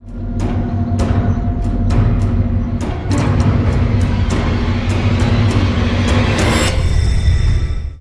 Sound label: Scarry effect; Spookslot Tunes Effecten